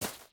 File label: gravel, step